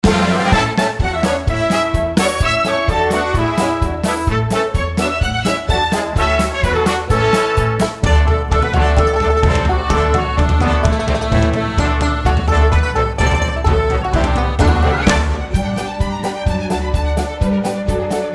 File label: bows and exit music